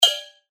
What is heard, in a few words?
percussion; Bakery; revealed percussion vol 3